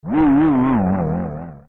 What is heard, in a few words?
Bongo Bongo Hit; zelda; OOT